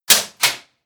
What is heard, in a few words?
Door Button Press; Guild